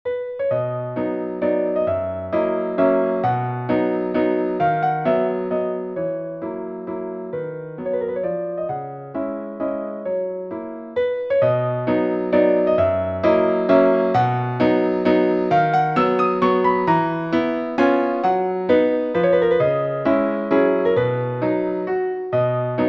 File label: Waltz